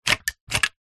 household vacuum cleaner dyson v7 stick attachement unclip remove